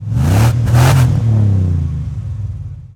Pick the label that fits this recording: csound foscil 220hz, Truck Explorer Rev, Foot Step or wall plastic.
Truck Explorer Rev